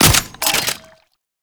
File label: Alt Fire Mech; weapons; Scaldra AK